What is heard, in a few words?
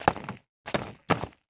Sports Ball Golf Ball Dropping Into Golf Bag Pocket Multiple